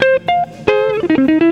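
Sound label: electric guitar melody; Best Service; World Colours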